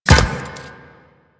Moa Step; Warframe; Quests; The New War; Veso

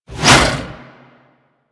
Scythe Whoosh; Stalker Scythe

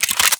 Burst Pistol Clip In; Warframe; DE; Weapons

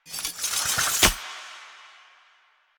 Idle Sword Twirl; Arthur; Hub; Warframe; Ambience; Nineteen Ninety Nine

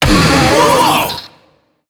Infested Lich Hard Drive Pain

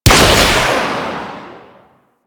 Arch Rocket Crossbow 3D Fire, Weapons; Warframe; Archwing

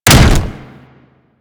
Charge Slam; Manic; Attack

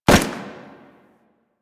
Fire, Rifle; Deth Cube Fire 2D; Warframe; Weapons; Sentinel; Deth Machine Rifle

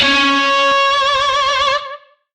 Shawzin C Sharp; Oct Three; Music; DE; Warframe; Lizzie Shawzin

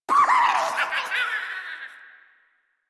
Death; Grineer Jester

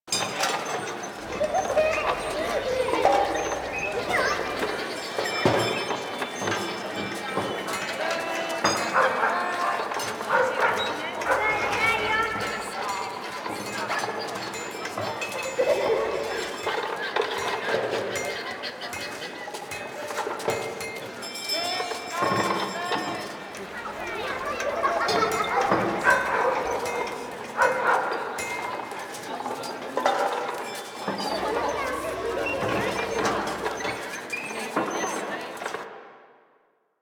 Ambient Loop B, Village Exterior; Warframe; Ambience; Eidolon Plains; Ostron Village

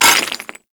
Melee Impact Cement; Warframe; Weapons

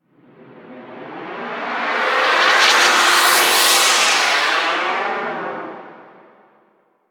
Gas Air Gust Short; Ambience; Warframe; Corpus Gas City

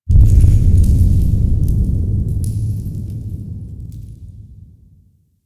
Interior Cave Deep Boom, Ambience; Warframe; Duviri

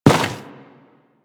Worker Step Default; Solaris; SolarisWorker; Steps; Warframe

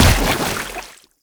Step Water, Footsteps; Warframe; Lasrian; Lasrian Soldiers; Foley; Lasrian Chem Strike Nox

